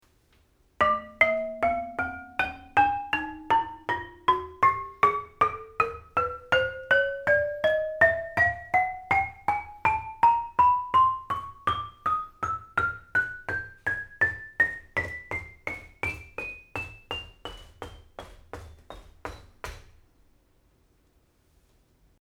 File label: lithophone black